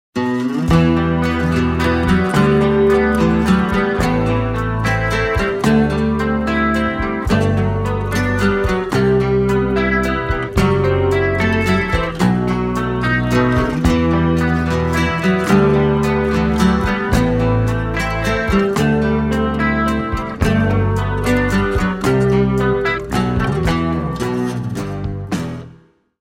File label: spanish guitar; ringtone